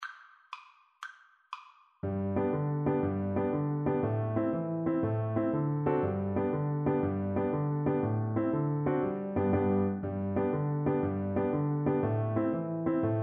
irish jig medley DB, Double Bass

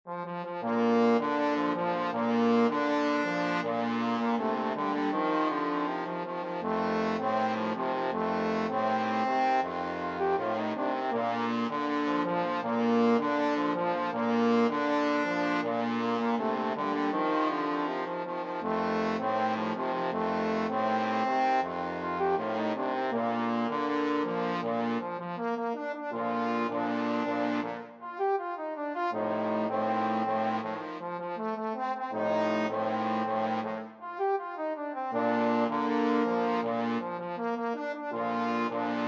trombone quartet

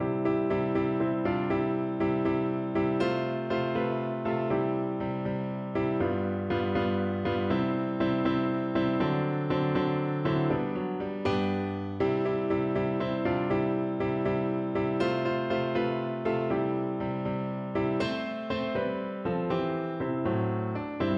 piano

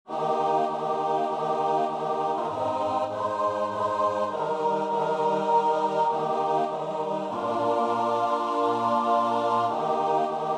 footsteps jesus

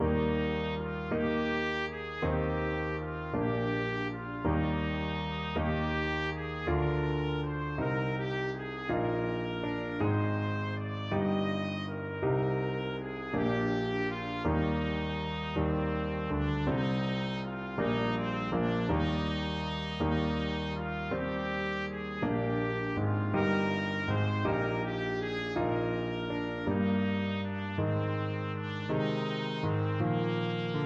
trumpet

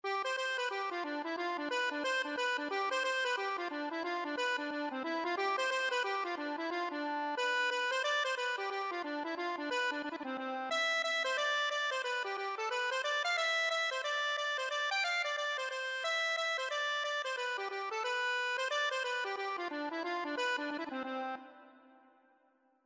accordion

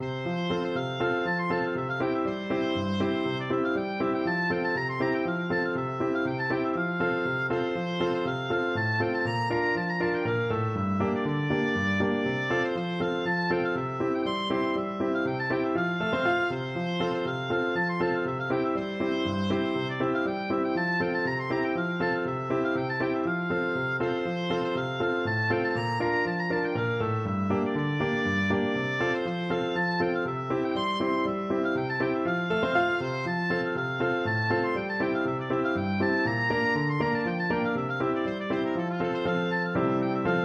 bingsjo storpolska, oboe

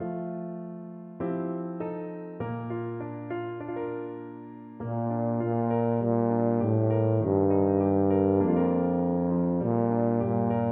nu zijt wellekome, tuba

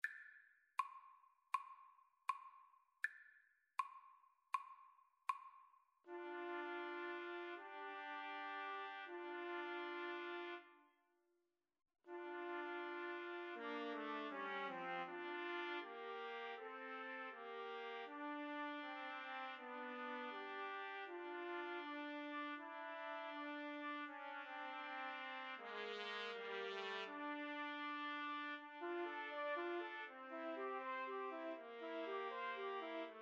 3TPT, Trumpet Trio

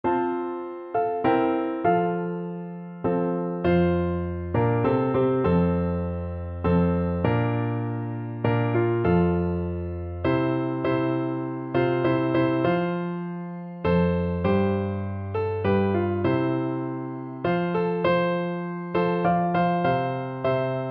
I Need Thee Every Hour for Bassoon

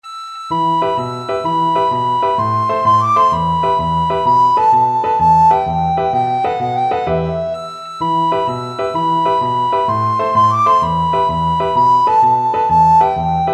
tarantella, recorder

